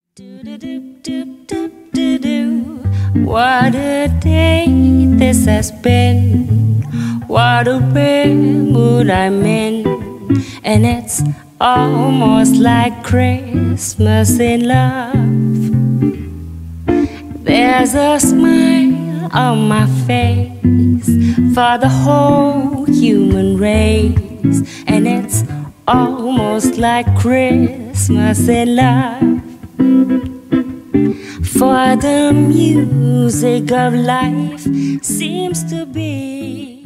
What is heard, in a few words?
Jazz Combo Christmas Song